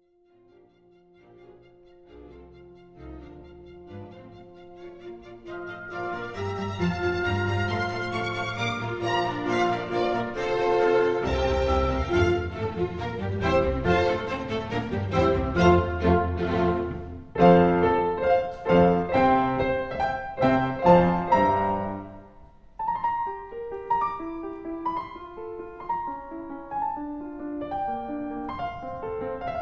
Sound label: Concerto 3 Pianos